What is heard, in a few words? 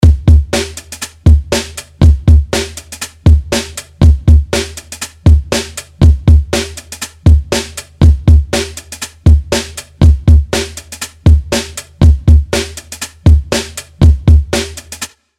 Drums